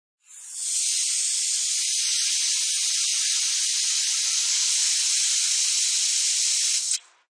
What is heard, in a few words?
Compressed Air, Compressed air from air compressor while filling car tire at gas station; Air Pumps